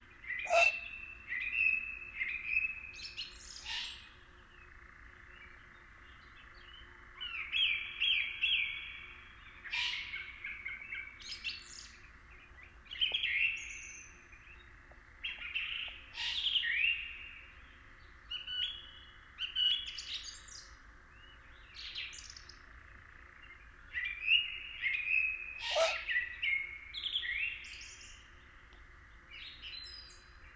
Eurasian Eagle Owl Begging Calls Of Two Fledged Juveniles